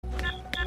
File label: hand link smack